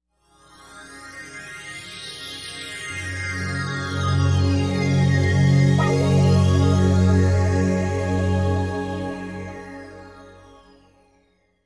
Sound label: Ambient Breeze